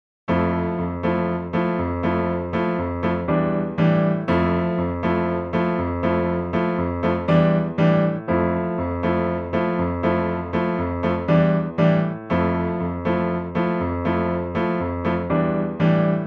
piano

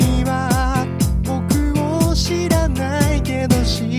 hihat

